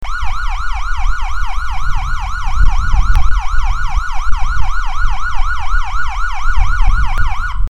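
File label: hiper yelp, siren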